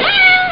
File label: miaow; neko dai suki